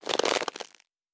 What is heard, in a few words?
Rope; Survival Game Sounds - Lite Edition